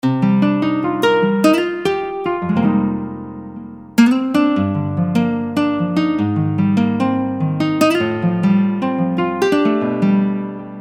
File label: Dyna Nylon; fantom-x8; patch demo